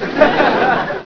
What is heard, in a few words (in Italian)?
suonerie persone risate